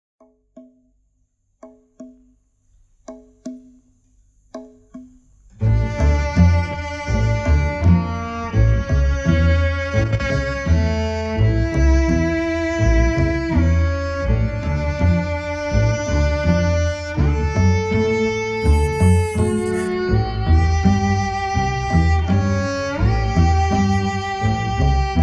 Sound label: Orchestra & Violoncelle